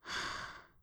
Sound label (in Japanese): ブレス ロング